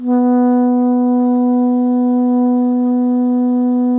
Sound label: SOPRANO B 2, Saxophones; Greytsounds; Philip Wolfe David Crozier's Saxophones